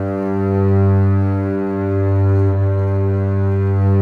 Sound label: Contrabasses, G1; Best Service ProSamples vol.51 - Classic Orchestra 2